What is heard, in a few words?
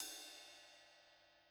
Crash, drum